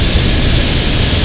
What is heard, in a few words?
Rocket Loop, Rocket Launcher